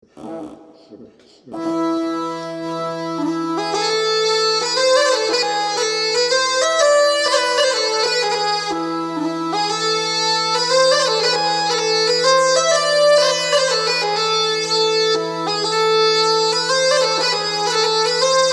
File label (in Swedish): Polska, bagpipe fiddler; efter Elias Jonsson Offerdal Jämtland